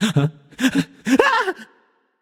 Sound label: nv fear; smite; voicepack; ganesha